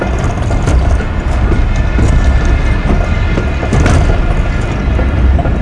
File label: vagon; Mafie 1